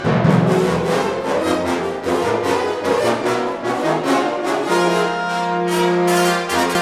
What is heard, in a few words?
CR FANFA; Roland - LA Composer vol. 2; FloodSet